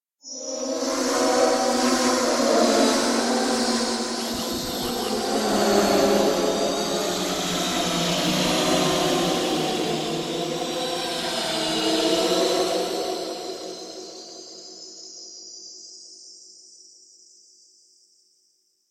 Mad voices; SyrinScape; Iris hill manor

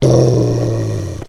wolf growl; The Elder Scrolls III - Morrowind; were

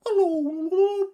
Hello bird; SyrinScape; Syrinscape unplugged; Jungleplanet dinolaser battle

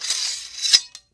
spear away; The Elder Scrolls III - Morrowind; Morrowind GOTY GOG

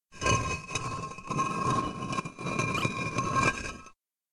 Deep Rumble Walls

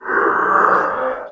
moan; The Elder Scrolls III - Morrowind

